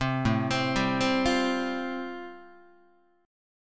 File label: A-Minor 7th Sharp 5th, Guitar, chord; Am7#5 arpeggiated; JGuitar